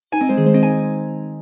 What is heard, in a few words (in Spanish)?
magia; alquimistas